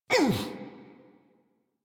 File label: Pain, Adult; Warframe; New War; Adult Operator